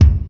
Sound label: original bass drum one shot D sharp key